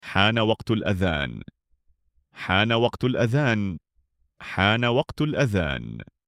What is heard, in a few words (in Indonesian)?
waqtul adzan